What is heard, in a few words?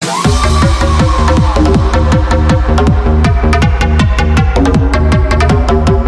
Hardbass